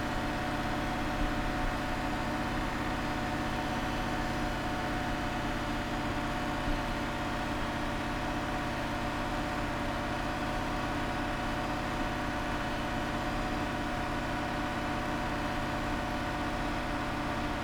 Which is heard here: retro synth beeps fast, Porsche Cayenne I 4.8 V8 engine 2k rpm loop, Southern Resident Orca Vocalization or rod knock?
Porsche Cayenne I 4.8 V8 engine 2k rpm loop